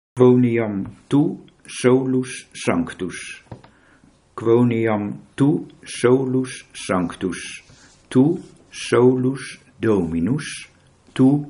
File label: Quoniam